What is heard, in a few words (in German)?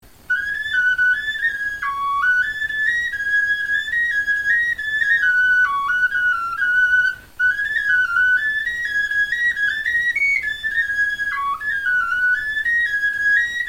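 melodie, Knochenflöten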